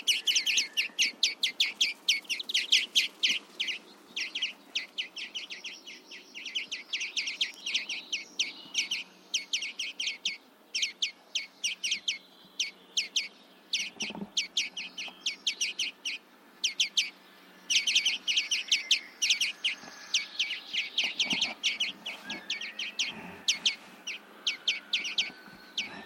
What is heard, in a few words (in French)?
verdier, Le verdier d'europe est petit et brunâtre avec un plastron orangé; Oiseau trapu avec un corps rondelet; Le verdier d'europe est petit et brunâtre avec un plastron orangé qui couvre toute la poitrine et la face; Le mâle adulte a les parties supérieures vert-olive